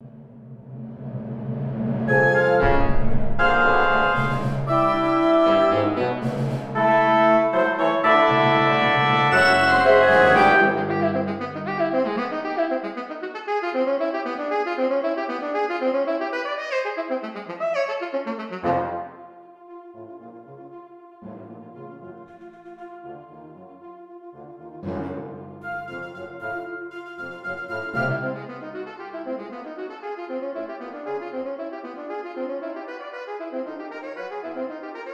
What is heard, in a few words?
sax band